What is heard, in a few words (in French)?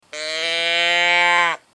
boite meuh vache